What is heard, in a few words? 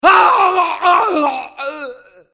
infected; csgo